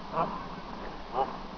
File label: mother goose remarks, Anser